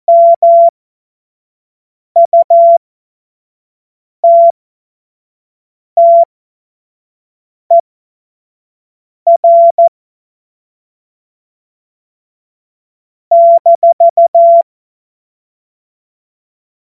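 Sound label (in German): Witz, Morsekurs, cw morsen; Witze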